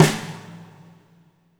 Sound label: FAT SNR R, WARM BALLAD; Spectrasonics; Burning Grooves CD2